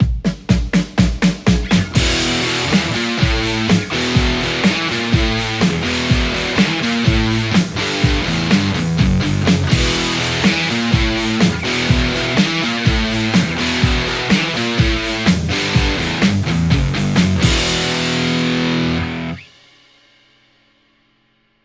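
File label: Telecaster; igniteamps nrr1 v2 00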